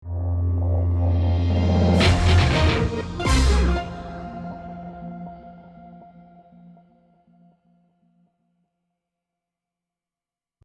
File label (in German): klingelton instrumental